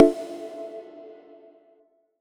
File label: menu multiplayer click; megamix